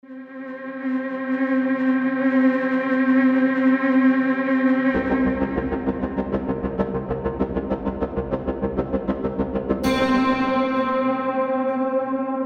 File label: Scoring Guitars Pads Low Ambient